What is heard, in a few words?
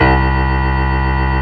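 reverse piano C2; AKAI S-Series CD-ROM Sound Library VOL-2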